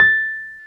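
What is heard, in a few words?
Wurlitzer electric piano A6; Keyboards of The 60's and 70's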